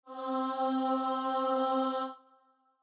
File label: starting note, voice; almost nothing